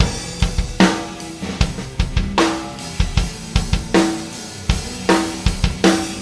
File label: loop